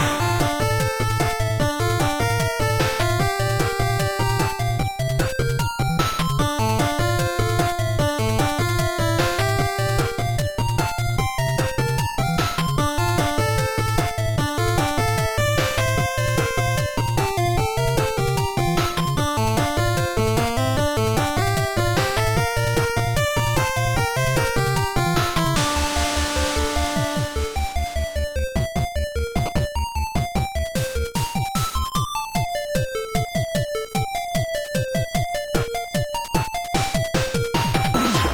space quest looped section, 8-bit space adventure theme